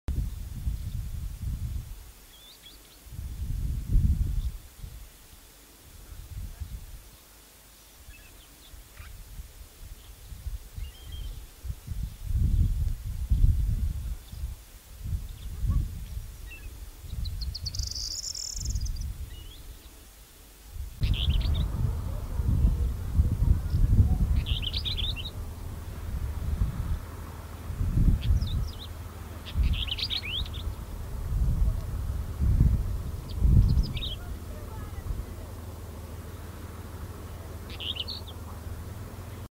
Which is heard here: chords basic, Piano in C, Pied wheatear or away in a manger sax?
Pied wheatear